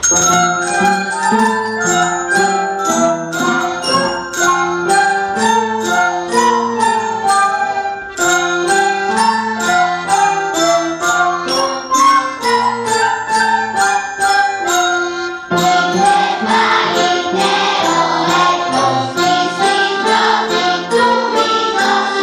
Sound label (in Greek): Κάλαντα Μακεδονίας Πρωτοχρονιάς